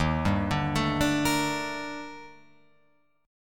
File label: D sharp Major Sharp 11th D sharp down, Guitar Open F; arpeggiated; JGuitar